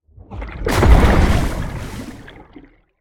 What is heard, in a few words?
deployable outro; smite; god ganesha skin11